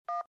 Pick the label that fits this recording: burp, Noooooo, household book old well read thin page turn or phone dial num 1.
phone dial num 1